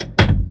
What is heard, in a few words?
door close; mario 64